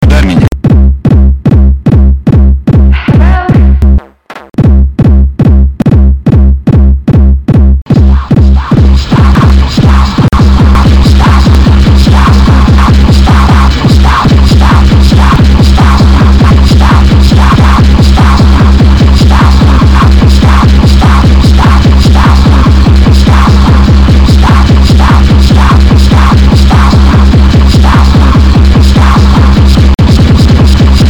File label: STOMP; lolicore